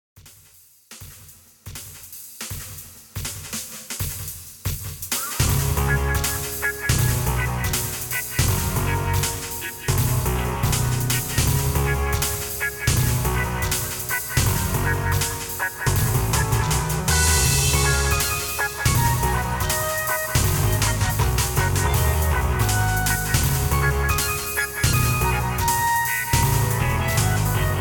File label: Reggae; Frog; frogatto friends